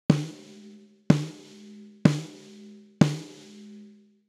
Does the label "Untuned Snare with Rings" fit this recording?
Yes